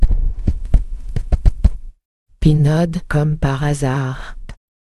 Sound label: jingle